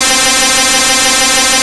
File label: noise